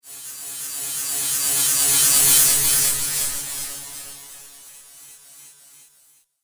Nasty Brittle Electric Noise, static

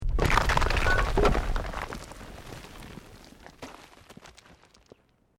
ROCK DIRT SLIDE SMALL

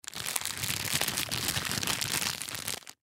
Bag Wax Paper; Rustling; Foley; Blastwave

